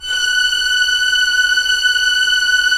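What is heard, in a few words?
Strings Violins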